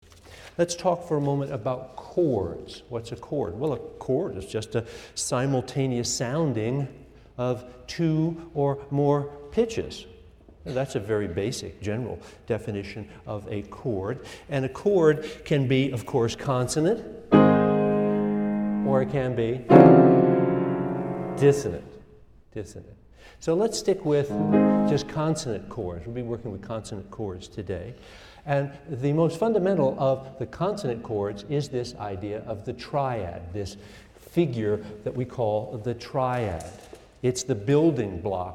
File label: chord cortado